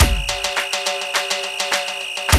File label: Loop, Roland; Sound & Vision - Gigapack I